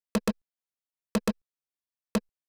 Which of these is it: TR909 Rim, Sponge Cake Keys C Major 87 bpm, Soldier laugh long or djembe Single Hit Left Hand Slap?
TR909 Rim